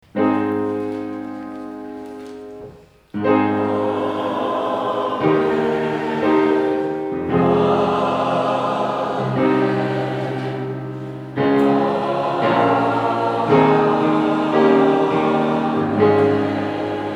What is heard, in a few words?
Amen